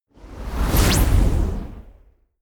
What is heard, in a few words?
sci-fi flyby plasma elastic